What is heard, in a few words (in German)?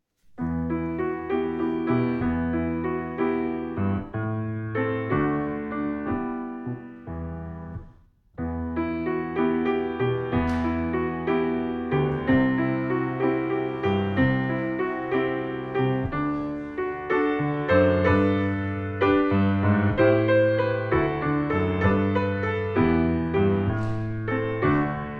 instrumental; engelschor lieder